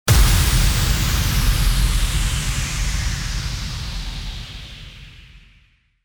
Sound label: IMPACT